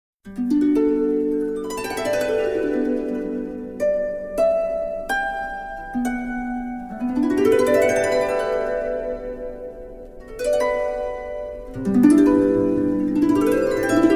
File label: harp